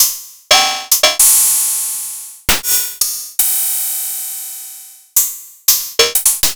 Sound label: ms20 raw cymbals